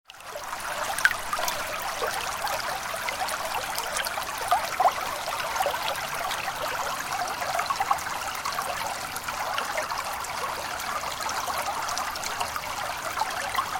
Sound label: Close sound of flowing water in natural stream.; Babbling Brook; Rivers; Nature